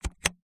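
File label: computer keystroke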